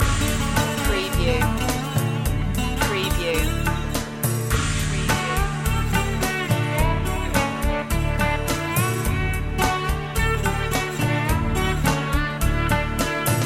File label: bombay blues loop